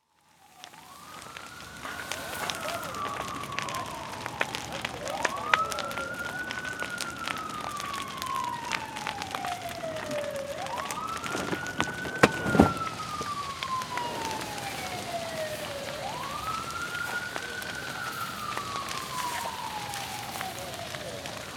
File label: fire noise with sirens